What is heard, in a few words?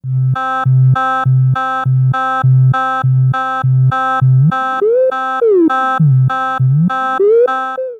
glissando illusion fade Left; This is a stereo sound file